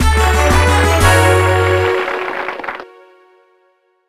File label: Success; USA Today Crossword Challenge; DS